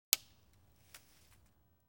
knackender zweig; geraeusche digital

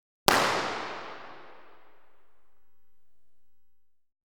pistolenschuss; geraeusche digital

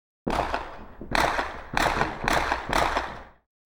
schuesse; geraeusche digital

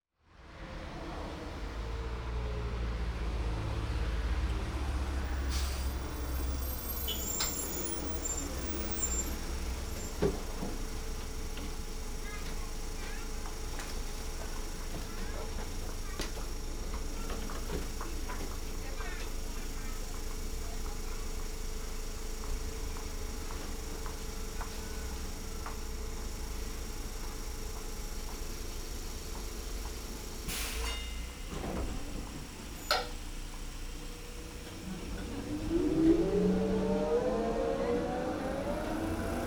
strassenbahn haelt an; geraeusche digital

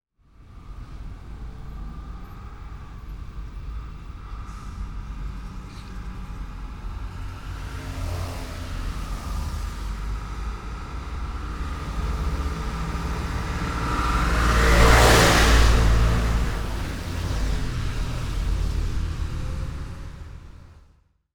schwerer lkw faehrt vorbei; geraeusche digital

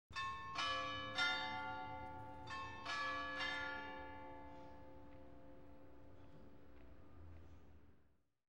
kirchenglocke schlaegt 2 mal; geraeusche digital

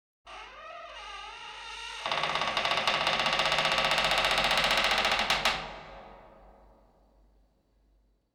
knarrende tuere; geraeusche digital

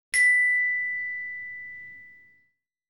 glockenspiel (c-dur-tonleiter) pro ton ca. 3 sec.; geraeusche digital

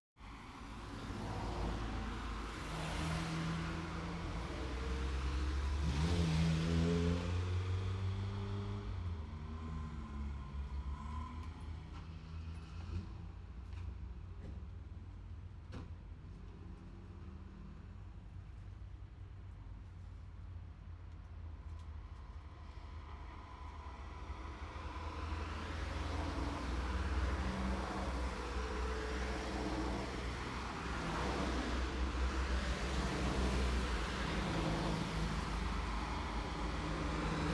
strassenverkehr in der stadt; geraeusche digital

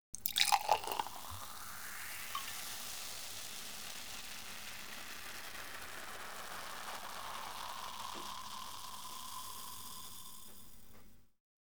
sekt wird in glas eingegossen; geraeusche digital

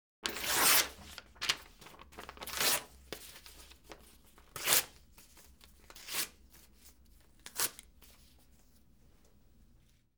papier wird zerissen; geraeusche digital

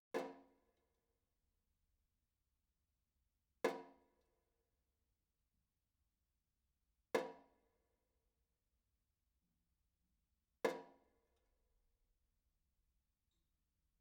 tropfender wasserhahn; geraeusche digital

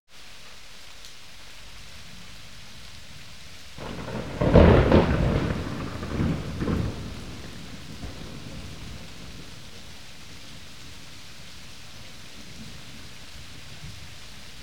donnerschlag; geraeusche digital

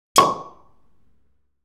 weinkorken; geraeusche digital

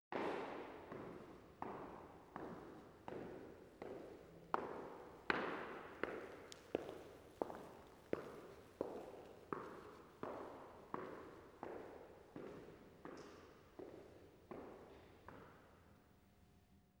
voruebergehende schritte; geraeusche digital

